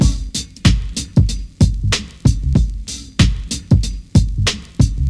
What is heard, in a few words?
Boombap drum loop